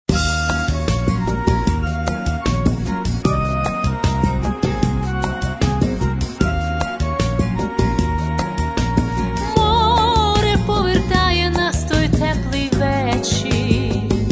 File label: samba